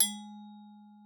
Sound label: TRIS G#3, VIBES; Xsample VOL-4